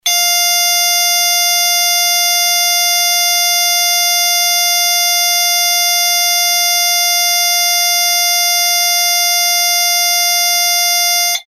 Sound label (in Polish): ton MIDI